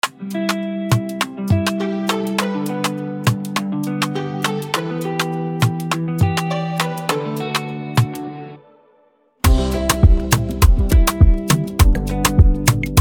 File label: BEAT